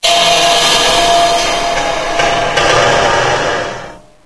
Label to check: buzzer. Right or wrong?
Right